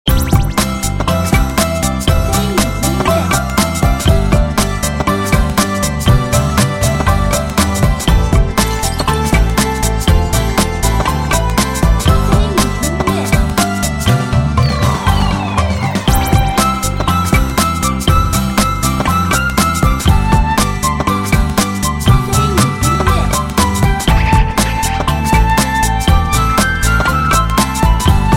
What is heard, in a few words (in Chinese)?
梦幻 童话 欢快